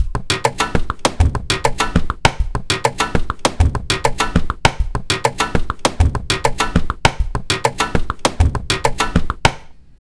percussiion lup

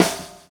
Chamber Snare; Sonic Foundry (Sony Creative Software); Drums & Drones; Construction Kits

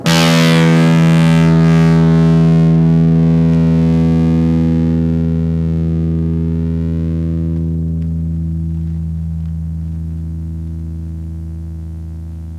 Blues Open E3, Blues Guitar

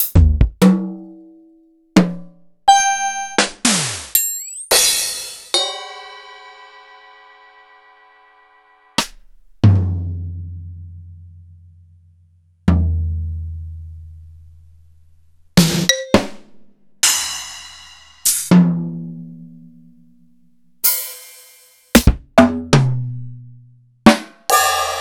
EMU PX-7; Sliced; m8

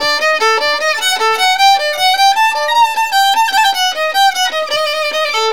VIOLIN, Balkanish Traditions

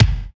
T. KICK-R, Phat & Phunky; East West - Phat & Phunky CD2; 90's Sample CDs

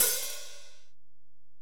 HiHat, Alesis DM-PRO

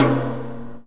TOM, Drum Dry; East Collexion; Drum 1

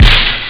acid sdrum; Amiga Soundtracker Sample Packs

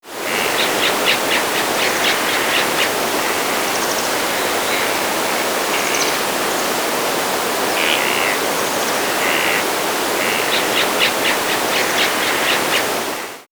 Nature By D Lake; X-Static Goldmine

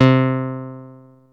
Bass Synths hits and octaves

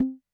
Conga High, Technics U90

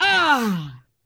VOX, VOCALS; Ueberschall; Jam Box

